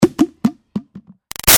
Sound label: Impact Cone Plastic Small Hollow Bounce; Materials; Big Room Sound